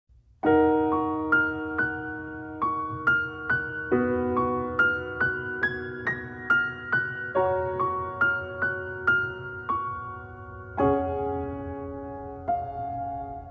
sad songs ringtone sad ringtone

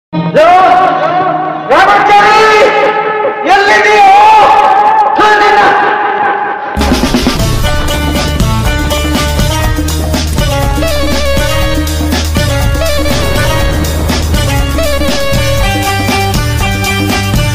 background music; nagarahavu

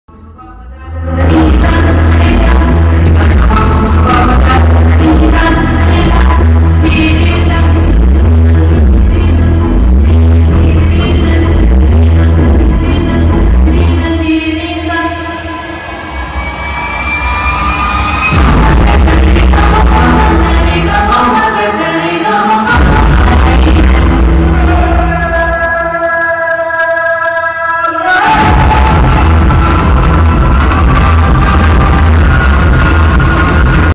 interval bgm; Sundaram Master movie

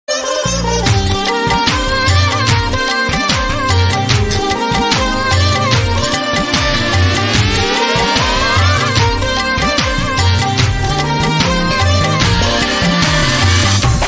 telugu new trending bgm

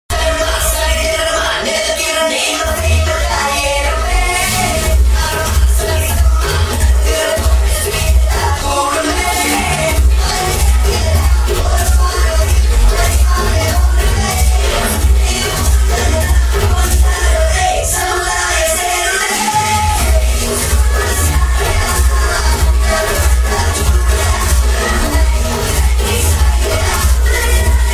tamil trending love bgm ringtones; tamil top 10 love bgm ringtones

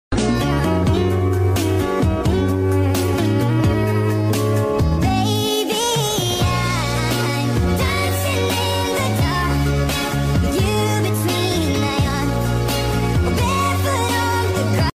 remix speed up

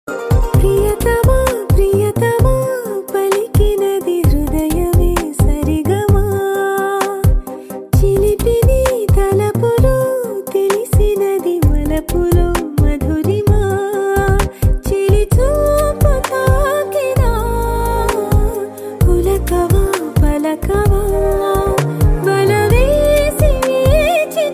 ringtone telugu ringtone; majili

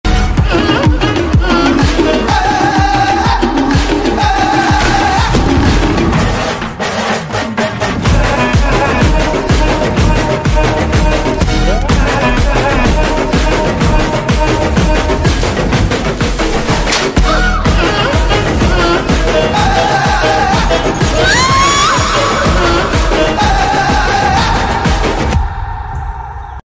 background music; mangalavaram movie

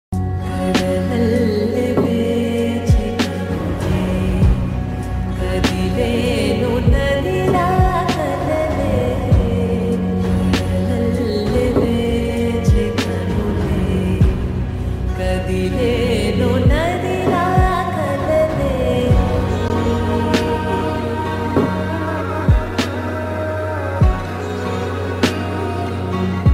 song ringtone; dear comrade movie; malayalam

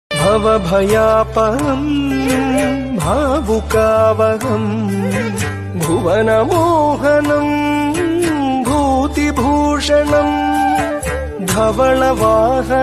ringtone

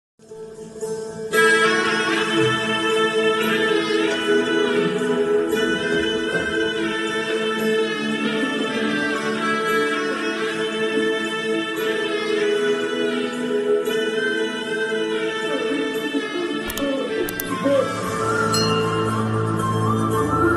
sad bgm ringtone; ram charan

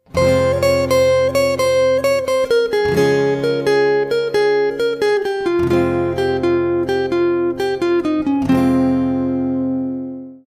romantic guitar; easiest romantic guitar tabs; guitar lov

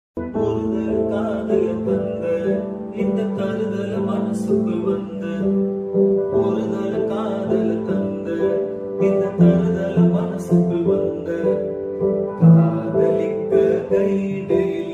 song tamil ringtone love romantic

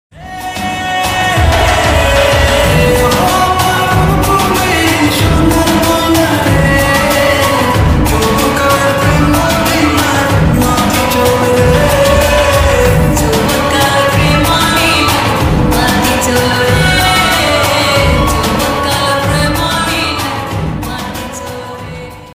bangla love ringtone

